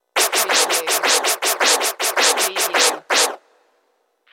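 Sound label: DJ Record Scratch Effect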